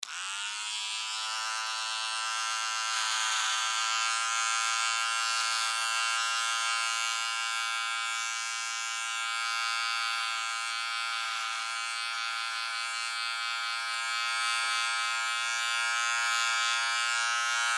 Clippers Run Cut, Clippers Run Cut Hair Barber Shop; Miscellaneous Electronic; INDUSTRY; Blastwave